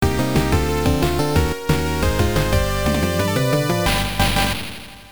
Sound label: stage complete